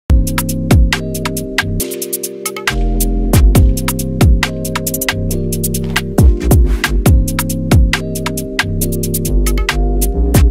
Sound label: Instrumental